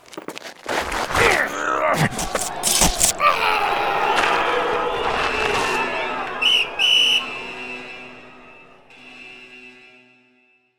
PrisonFight

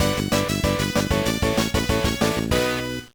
Jingle, gba; Backyard Baseball 2006

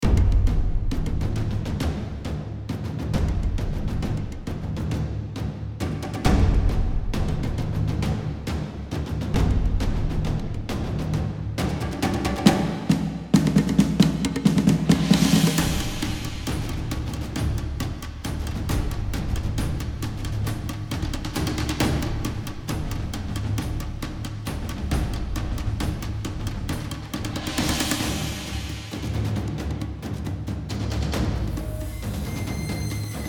Battle Intensity; Pendragon

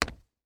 footsteps soft sole shoes plywood jumping

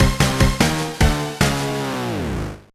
Lose; Monster Truck Madness; GBA

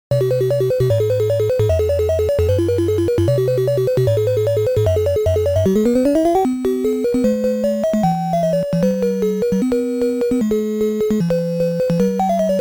Main Music

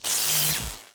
zap bug

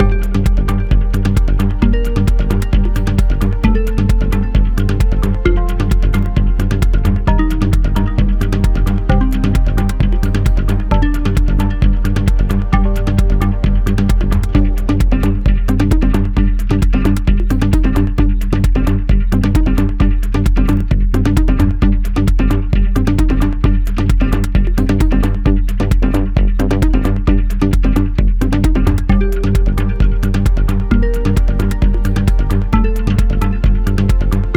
Panic Loop; pang adventures

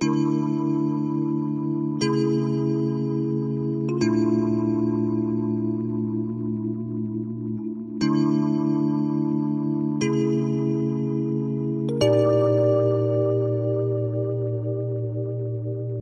rhodes; Toki Tori 2+; Toki Tori Collection

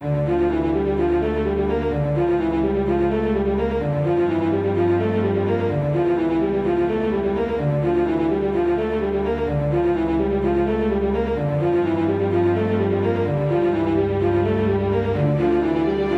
Hostile Tension; Celestian Tales Realms Beyond

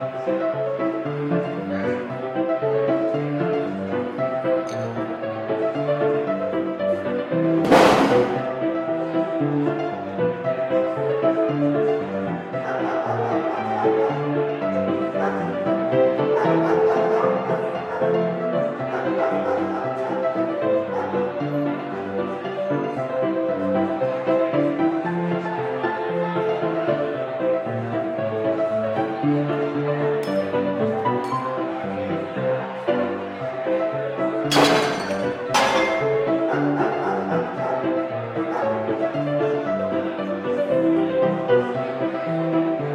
Pub Piano LOOP, piano bar ambience; LOC Victorian

